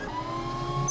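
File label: weapon charged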